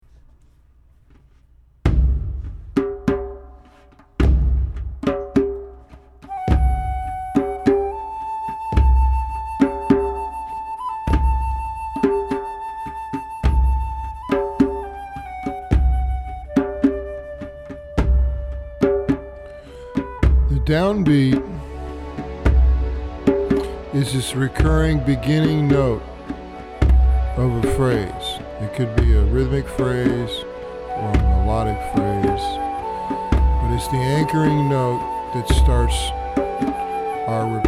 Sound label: downbeat flute; Drumming Meditation Introduction